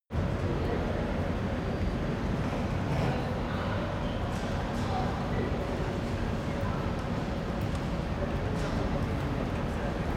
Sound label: train station ventilation people